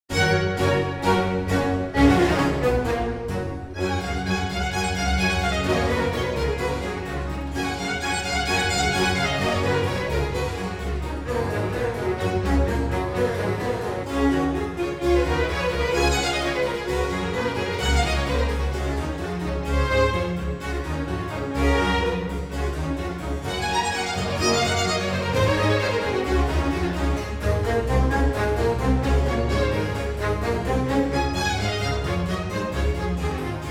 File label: Sinfonia in G major for strings and harpsichord Allegro molto; RV 149; A. Vivaldi; Concertos and Sinfonias; 尼古拉斯 · 麦克吉根